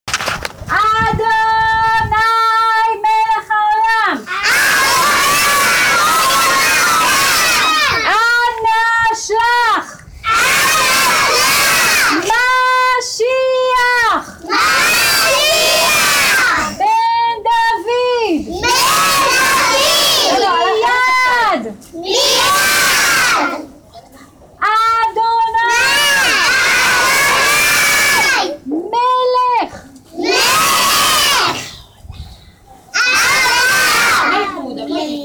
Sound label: children shout